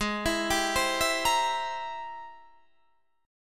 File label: G sharp Augmented Major 9th G sharp, down; G#+M9; arpeggiated